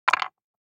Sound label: DICE on Wooden Tray, Throw and Roll, Metal, 2 Two Dice; Samples; ArticulatedSounds; DICE Sfx Party (Extended)